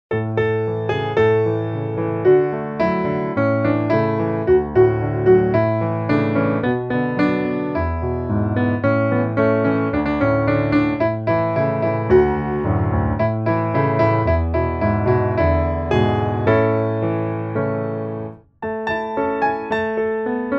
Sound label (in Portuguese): piano